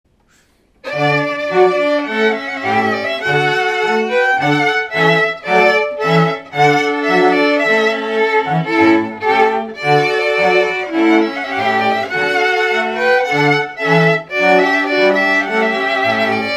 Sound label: Trumpet Voluntary, String Quartet; Classern Quartet